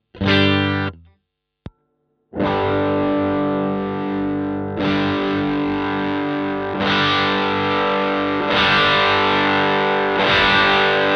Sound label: BM20 ULTRA Gain max Ge Ge Tone Range